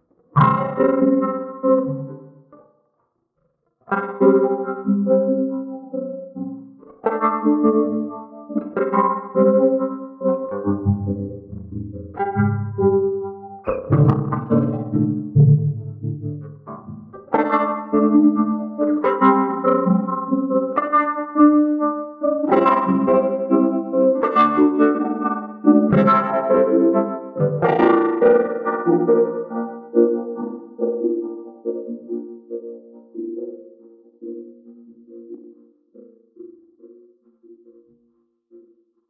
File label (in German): Moog Moogerfooger Plug Ins Gitarre